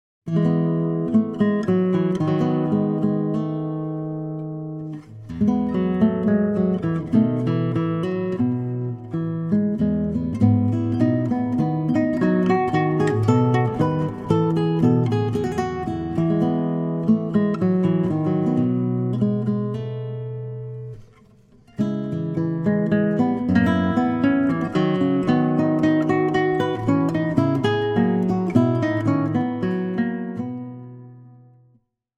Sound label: Minuet